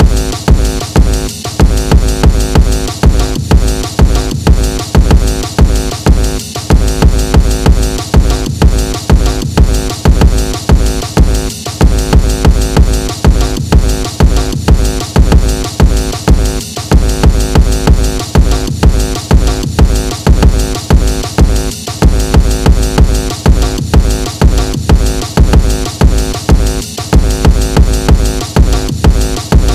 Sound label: 188 bpm breakcore